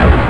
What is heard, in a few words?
big psionic; Odyssey Demo